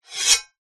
warfare sword medieval heavy draw scabbard

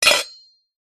metal pan close down on pan; household; zapsplat

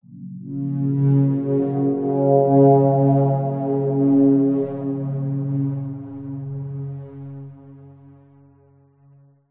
synth space ethereal ghost drone accent; Jessey Drake

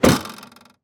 SUV Trunk Close; Blastwave FX

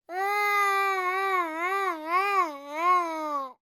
human boy three years old cry

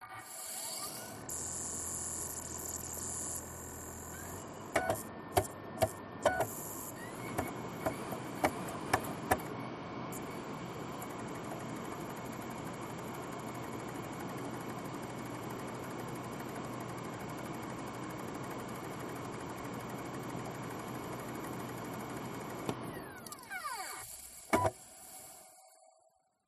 cd drive operating; the sound pack tree

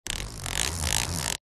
household blind roller pull chord rub clicks

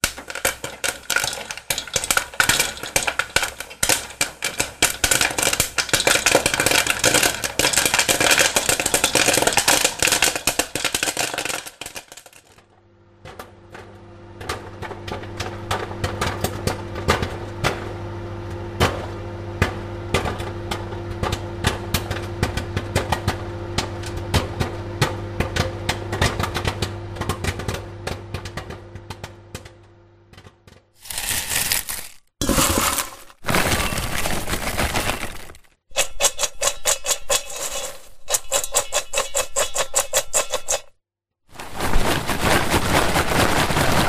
popcorn; zapsplat

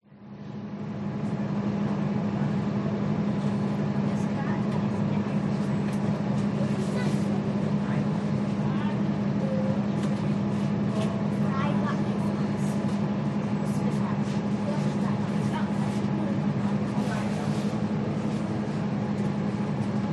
bus ride inside not busy slow, singapore

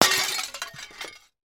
impact glass window smash; sound effects one

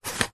foley plastic dial turn short

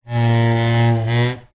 household chair slide wood floor rub squeak

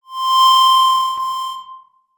technology microphone feedback

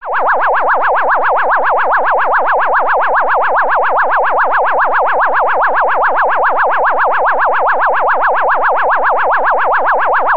science fiction alarm siren wobble

